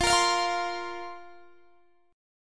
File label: F Suspended 4th F, Guitar Standard; Fsus4 strummed; x,20,20,22,18,20; JGuitar